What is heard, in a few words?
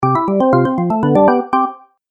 Organ Game Music; Cartoon Music Sound Effects